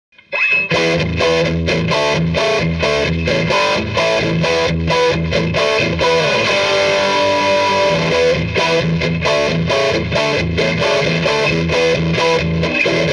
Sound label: lp brpup dirty deluxe